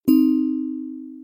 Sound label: oxygen, bells; build-an-atom